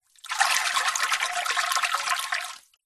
nature water surface agitate swirl fast finger gentle plastic tub